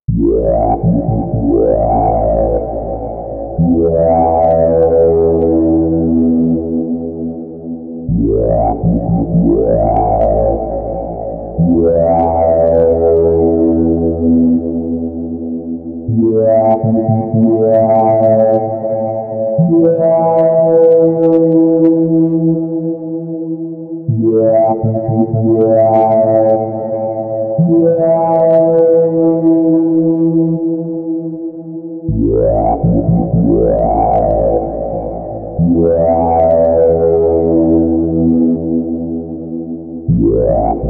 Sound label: Sine; gekiban